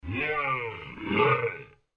Zombies On The Loose; Zomby Sound Effects; horror sound effect

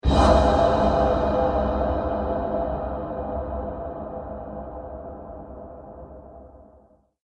Epic Impact; Miscellaneous Impact Sound Effects

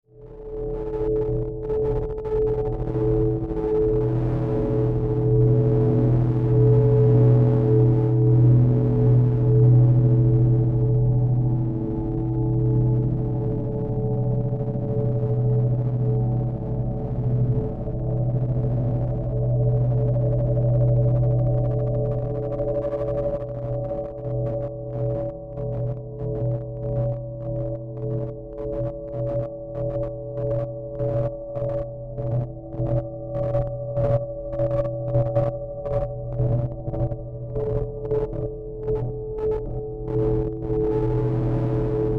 Drone Dark Stutter Electrical Surge; Blastwave

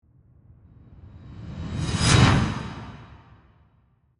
Whoosh Metallic, Slice; Whoosh Sound Effects; Whooshes

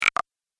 Game Pause, video game

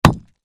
Impact Rock Large Hit; Rocks; Materials; Sound Effects:Materials; Blastwave

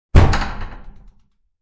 Door Bust Opn, Busting open a locked door.; Breaking; Objects

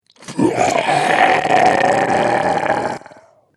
Zombie Gurgle Growl; Zomby Sound Effects; horror

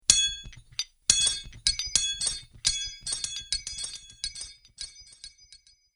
Metal Chains Magic Ambience; Clink, Clatter, Clang, And Clink Are The Sounds That These Chains Make.

